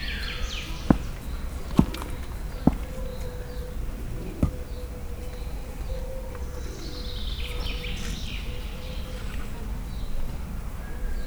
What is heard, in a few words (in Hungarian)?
patadobogás, magyar parlagi szamár; szegedi zoo 2011